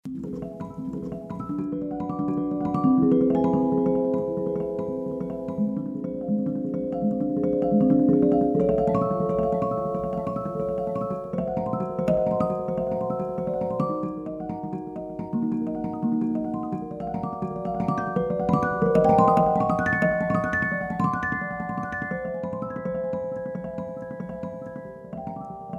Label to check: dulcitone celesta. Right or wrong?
Right